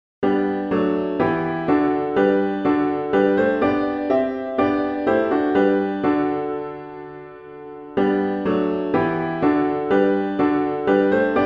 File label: piano all parts; Psalm8